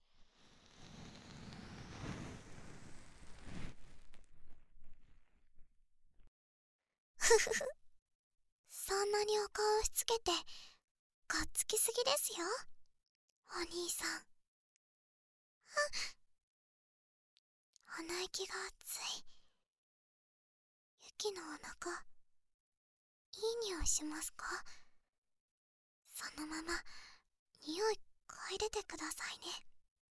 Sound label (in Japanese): 左耳かきパート